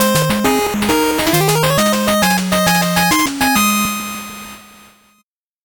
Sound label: 8bit jingle slow